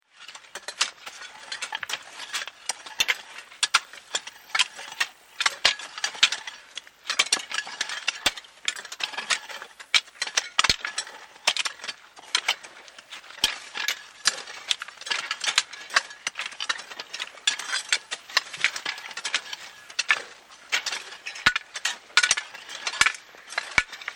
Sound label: Field workers with Hoes and Rakes, Raking and hoeing ground; Farms; Tom Hutchings